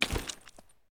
gravel footstep

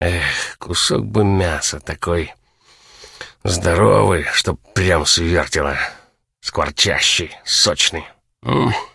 idle, Human

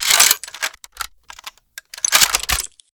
rp46 load, weapon

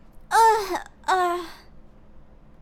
death

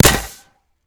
helsing shoot, weapon

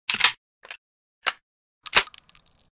duplet unload, weapon

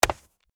wood, bullet hit; metro

